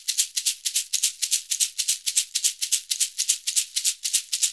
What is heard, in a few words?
SHK, Shaker; Univers Sons; Basicussions